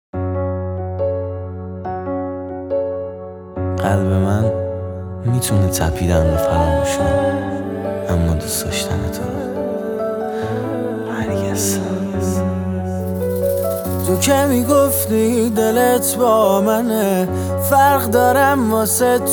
Gole Nazam (Piano Version)

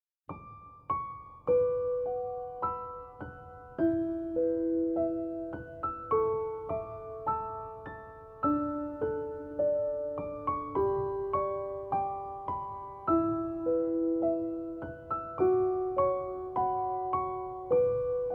Rain Lullaby

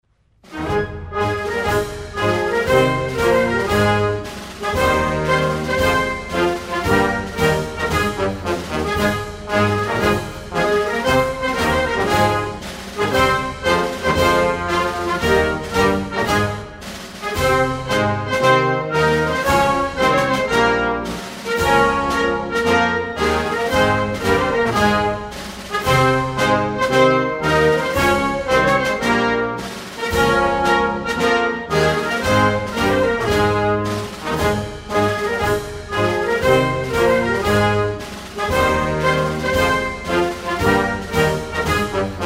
Tunisia National Anthem

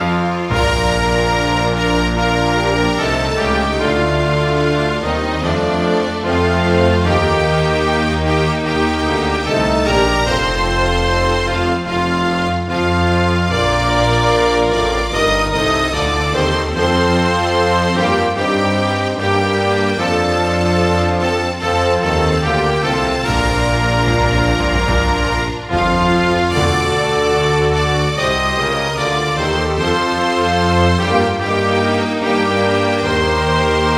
North Korea National Anthem

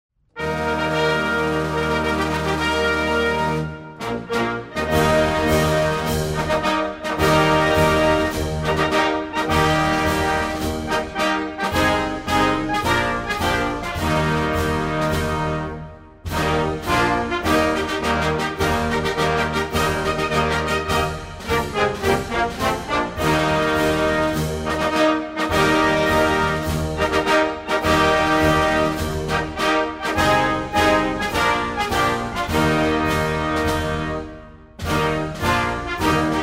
Bahrain National Anthem

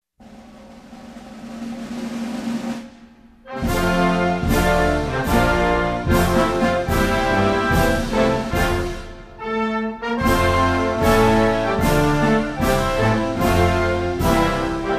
Egypt National Anthem

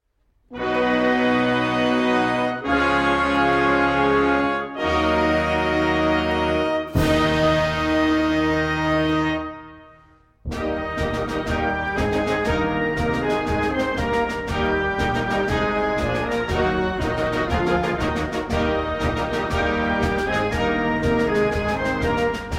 Afghanistan National Anthem

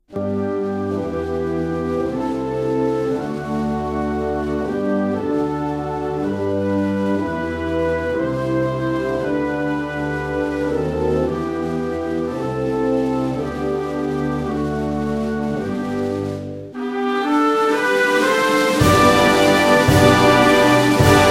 England National Anthem

